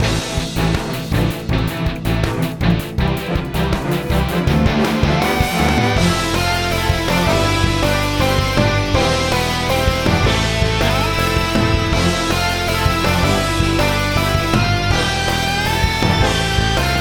Battle

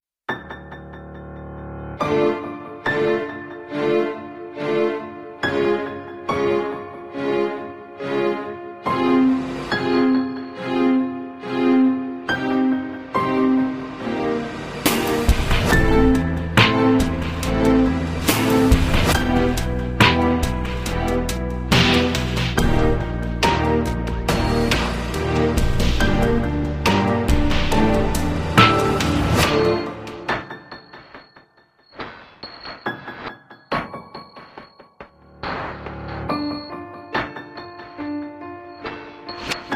Revenge in D Minor; Fault Milestone One

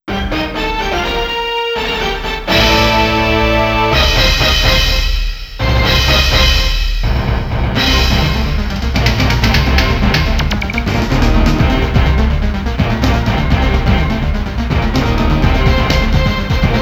Stage 4 BGM; SNK Arcade Sound Digital Collection Vol. 04

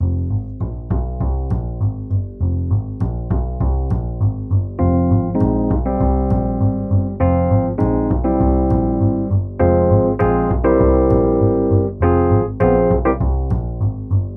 Sled Racing Jazz

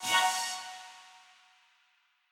Quasar Clear B; Mixolumia

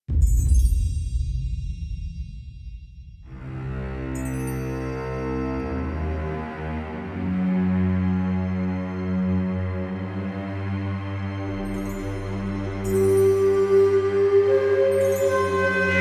Jingle Arab; rise of venice windows gamerip 2013

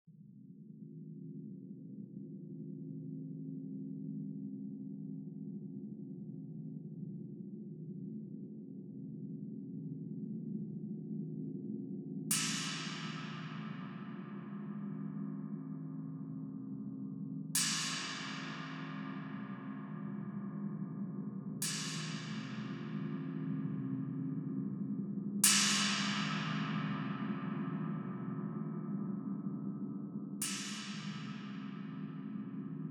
Cymbal drone; raji an ancient epic

